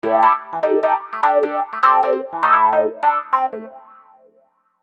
Wah Good Ep; Construction Kit; HIP-HOP; Sonic Foundry (Sony Creative Software)

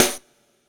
SD, Dance Mega Drums; Best Service

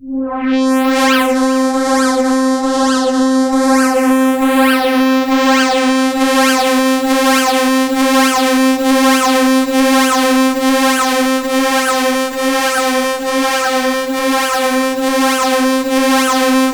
C4, Synth Line; 90 s Sample CDs; NiceBeats; SynthLine VOL-1

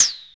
FX DRM, Analog; Sound & Vision - Gigapack II

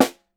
SN, Percussion

